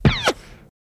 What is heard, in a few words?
Machine Gun Silencer Shots Single With Impact